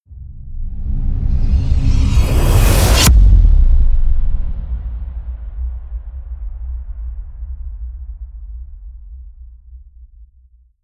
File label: hit big rip